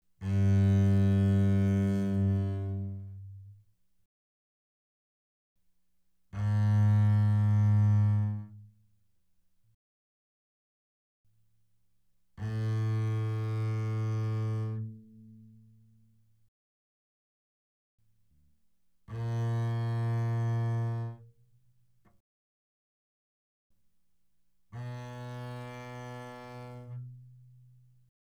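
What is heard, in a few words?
Bass arco fortissimo sul G G2 B2, doublebass; Strings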